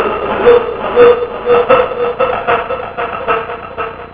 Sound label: real ghost laugh